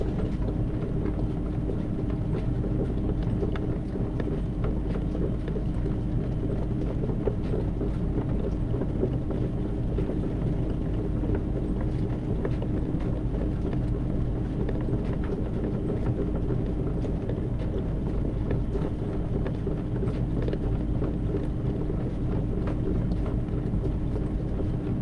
antique mill metal wood clank machine; sonniss gdc

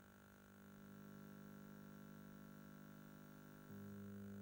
snes power on static; roguewaves; supercart

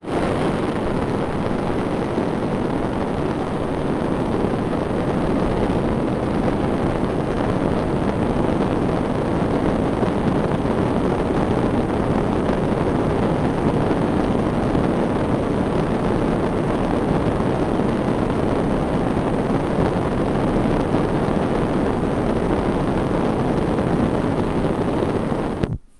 gas burner stereo; sonniss gdc

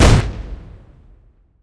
Fire; lancer weapon fx

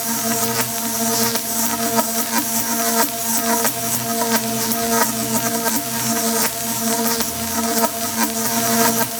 Electricity Arcs; Cyre Lightning Rail

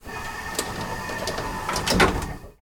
soviet elevator door close; sonniss gdc

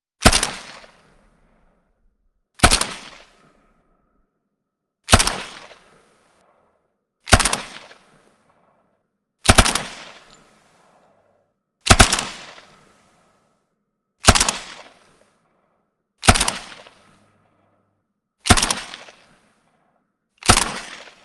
wep smg m3 greasegun submachine gun single shot; ww2; woww2; Sonniss GDC

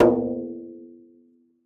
banjo drum hit; fienup; sonniss gdc

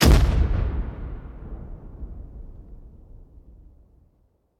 Missile Launch; lancer weapon fx

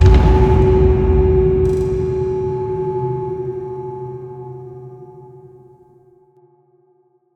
stinger cello; Sonniss GDC

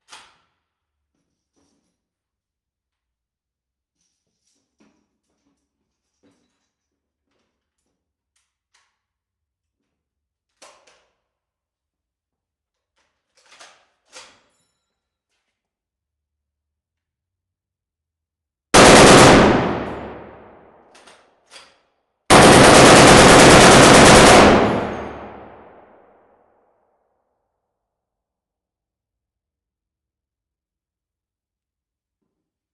medium warehouse browning m2; audiobeast; sonniss gdc

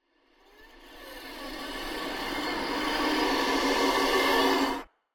riser; sonniss gdc

